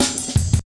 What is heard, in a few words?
heavy live ride cut, drum loops; Zero-G Jungle Warfare 1, 2 & 3